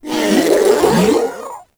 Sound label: Strike Scream; Enemies; Abilities